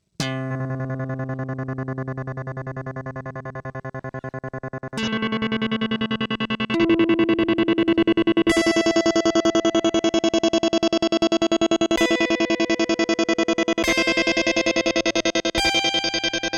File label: Superego plus Trem2 Latch